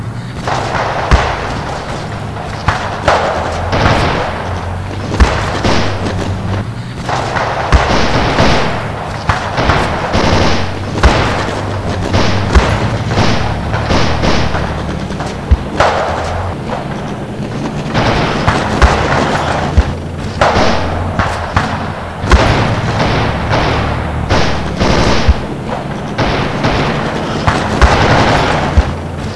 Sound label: War city